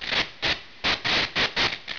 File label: sparks, ambience